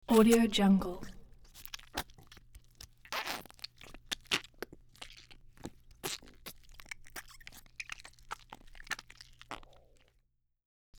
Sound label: thick liquid forced in and out of tube